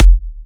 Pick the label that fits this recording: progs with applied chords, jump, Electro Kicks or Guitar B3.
Electro Kicks